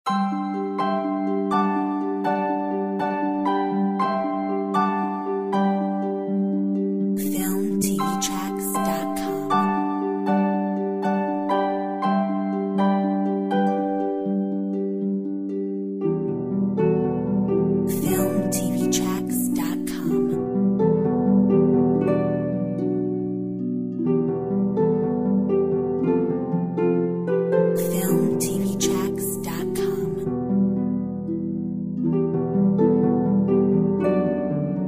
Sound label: Harp